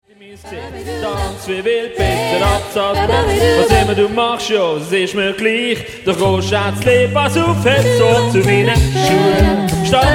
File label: Jive